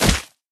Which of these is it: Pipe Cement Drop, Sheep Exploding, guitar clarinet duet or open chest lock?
Sheep Exploding